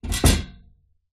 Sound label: household chair metal legs set down wooden floor